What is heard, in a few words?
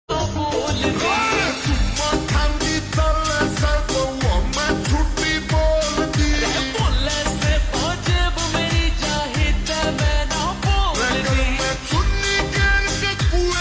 Slowed Reverb Ringtone